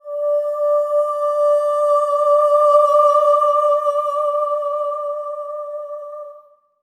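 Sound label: SOP X2 D5 R, soprano; Spectrasonics; Symphony of Voices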